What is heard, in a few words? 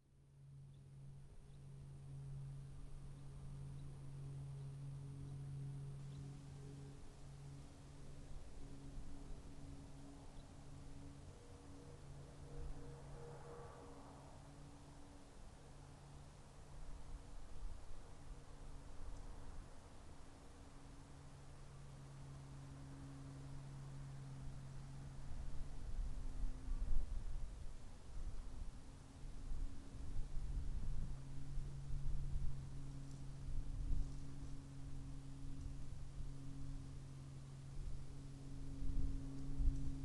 extra 300 ext land up land by distant; sonniss gdc